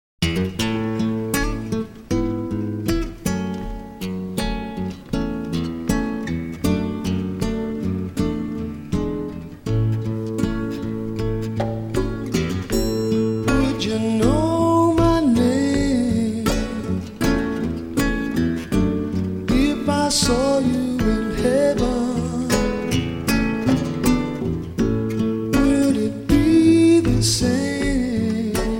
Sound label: Acoustic; Live